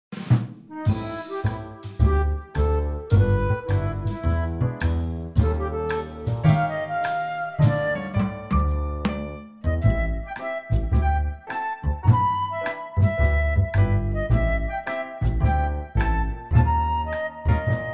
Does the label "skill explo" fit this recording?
No